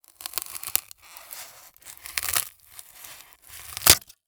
Industry Tool Scissors Cut Styrofoam

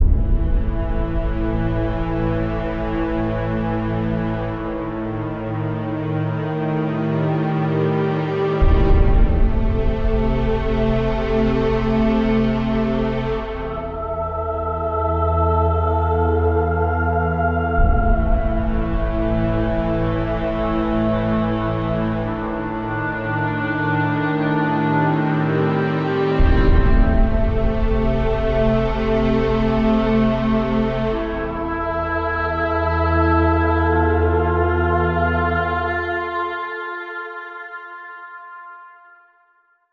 Slow 108BPM, Cinematic Trailer Music; BlueFX

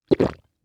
Human Swallow

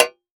Impact Shovel Hit By Plastic Tube

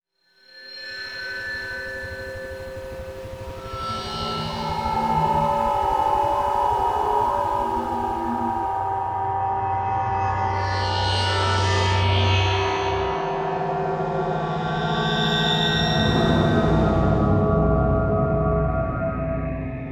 Electronic Whoosh Reverse Feedback Digital

